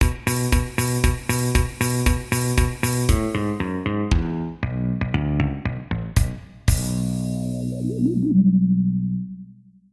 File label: badending